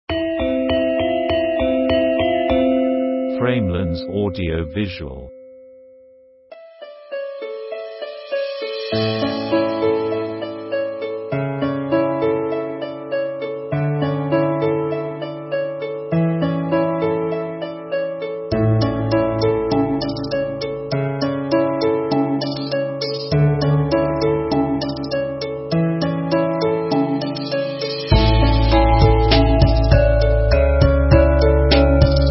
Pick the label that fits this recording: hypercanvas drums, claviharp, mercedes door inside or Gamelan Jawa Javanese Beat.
Gamelan Jawa Javanese Beat